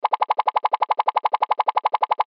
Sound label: Toy Motor; Miscellaneous Toy; Cartoon; Toys; Blastwave